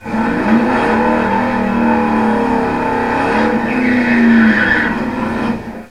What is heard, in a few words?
Burnout wave